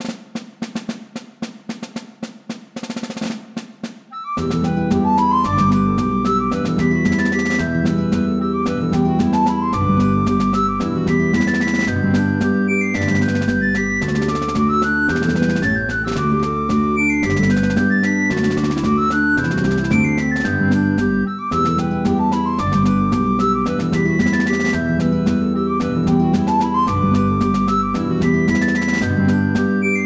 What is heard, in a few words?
Hornpipe; MTWFC VOL1 Audio Accompaniments